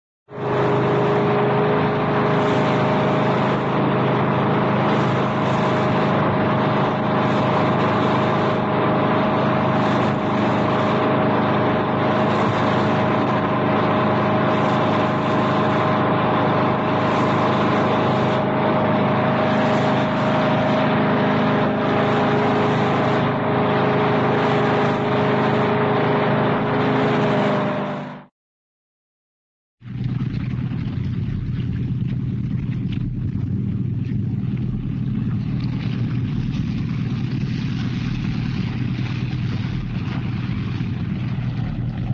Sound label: boat, jet fast steady driving, on board; cartoon